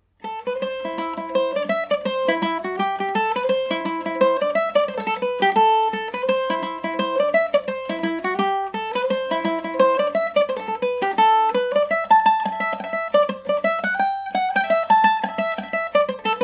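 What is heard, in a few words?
A dorian md304, Irish mandolin; scotch mary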